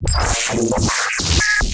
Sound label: glitch